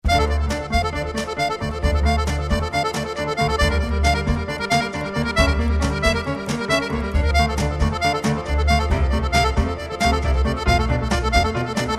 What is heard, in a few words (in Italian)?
quartetto